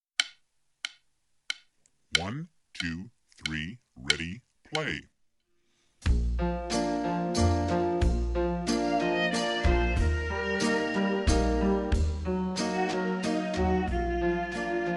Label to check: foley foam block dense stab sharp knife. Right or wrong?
Wrong